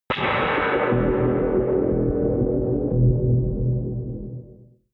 synth hit whip fall; whooshes rises; hits impacts

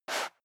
step high heels sand slide short; schritte

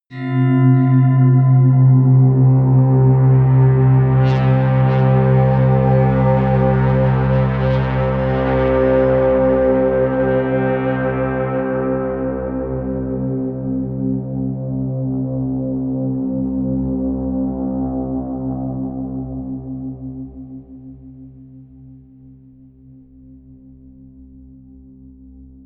drone tibetan

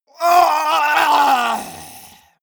male die gurgling; soundpool

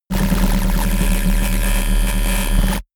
space charged laser blaster unsteady hard; krieg-waffen; soundpool

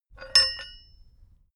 metal bolt ring; industrie-wirtschaft

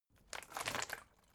bottle water big shake single soft; berufe

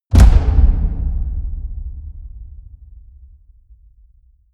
drum hit hollow medium; percussion

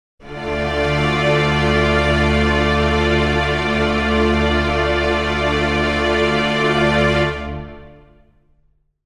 string chord d minor

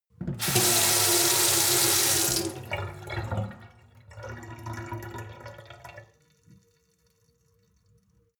sink water flow hard; industrie-wirtschaft; gastronomie

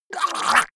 monster gurgling high; kreaturen

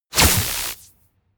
spark; comedy cartoon

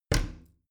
bathroom cabinet both close regular; objekte; tueren

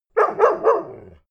bark three times, hunde; natur-tiere

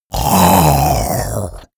monster choking zombie; comedy-cartoon; soundpool

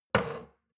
shot glass place table slide medium, gastronomie; berufe; soundpool

